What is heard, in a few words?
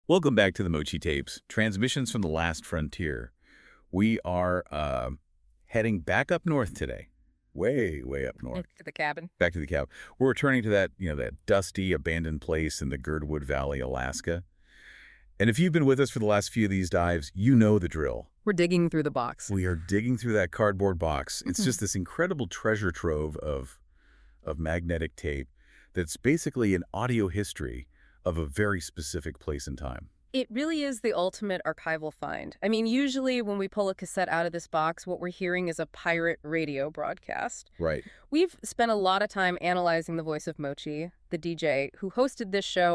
Live, Halloween